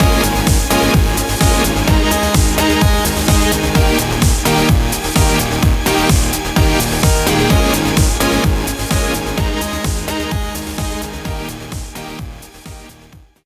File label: Stinger; Champions Field